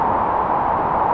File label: ARP2600, modular syn; Sonic Reality; Vintage Timetraveler